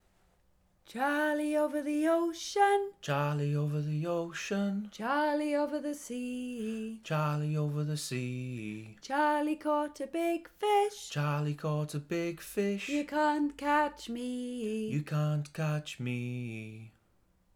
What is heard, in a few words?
Charlie Over the Ocean Full Song